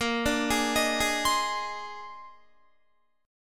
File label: A sharp 11th, Guitar; Listen to A#11 arpeggiated; JGuitar